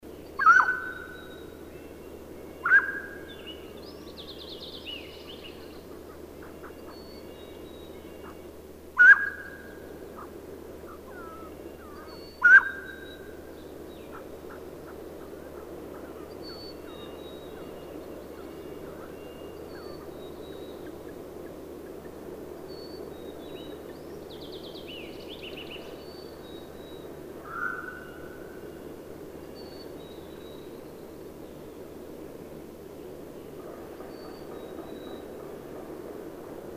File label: Mountain Quail